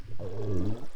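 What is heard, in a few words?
Growl, fish sounds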